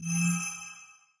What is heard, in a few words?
button mouseover